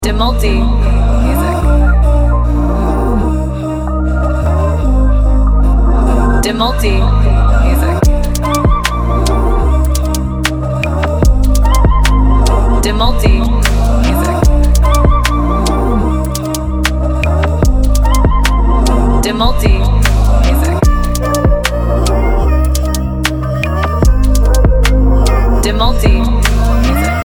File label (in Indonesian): edm, membalut nuansa EDM yang santai dengan permainan vocal chop tinggi dan rendah